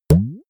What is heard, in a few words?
boing